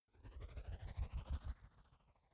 fly by alien hover

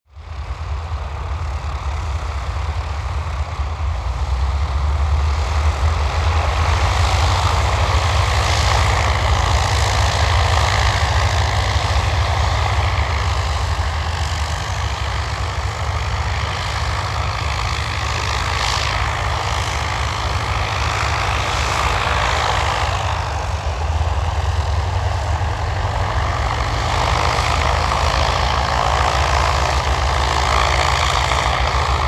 propeller plane ju52 maneuver medium close

